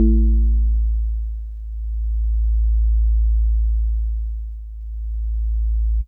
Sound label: Jazzy Organ DX100 A1